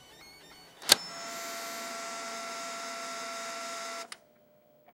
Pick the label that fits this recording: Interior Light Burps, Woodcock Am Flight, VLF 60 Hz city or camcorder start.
camcorder start